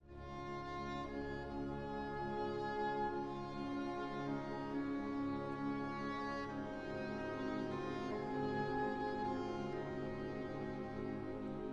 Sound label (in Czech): Symfonie č. 9 e moll, Largo, Orchestr; 1893, 2. věta; Antonín Dvořák; "Z nového světa"; Orchestr čes. rozhlasu; dir. Vladimír Válek